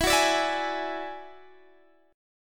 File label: F sharp 7th Suspended 2nd Sharp 5th, E